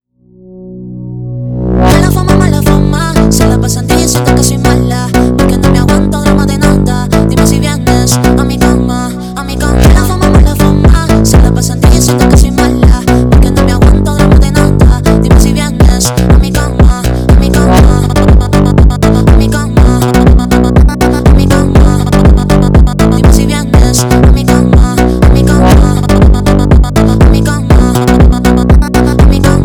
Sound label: phonk